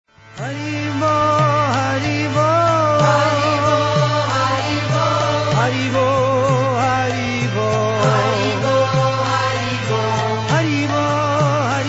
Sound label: Hari Bol